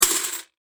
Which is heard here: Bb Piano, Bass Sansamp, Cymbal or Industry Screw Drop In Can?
Industry Screw Drop In Can